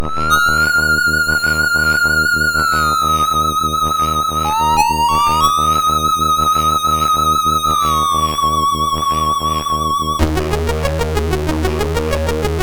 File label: sid MOS6581R2